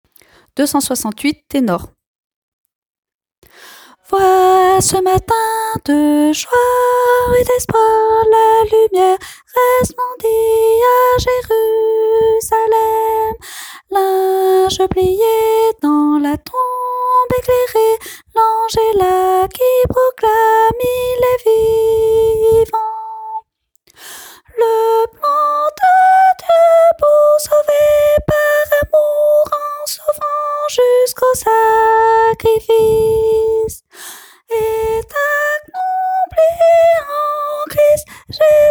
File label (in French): ténor